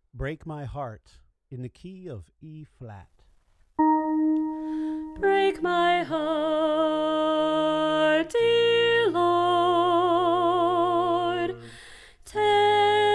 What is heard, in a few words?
Soprano